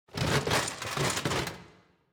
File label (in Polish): otwieranie skrzyni